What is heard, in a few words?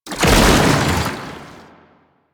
Tank Infested Melee Charge; Enemies; Abilities; Warframe; Lasrian